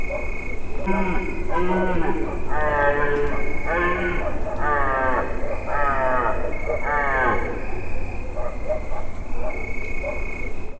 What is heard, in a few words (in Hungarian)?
tücsök kutya, gímszarvas; termeszetben